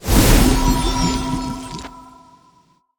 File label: Water Spell Cast; AudioWizards